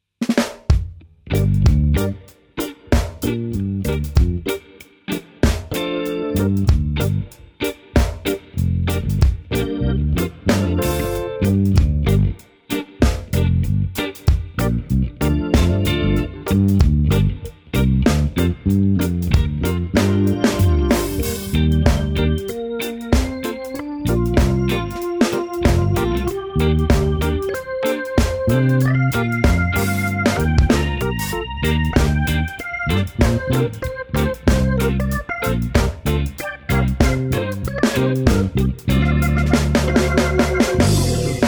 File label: reggae drum loops 95bpm